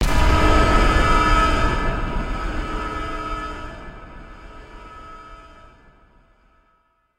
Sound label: objective warning big